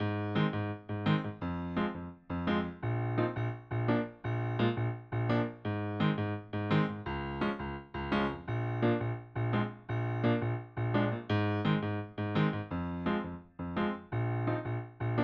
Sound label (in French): piano boucle